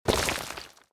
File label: fix Ground